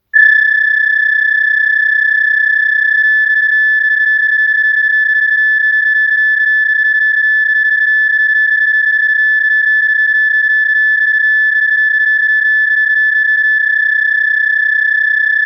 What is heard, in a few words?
new noise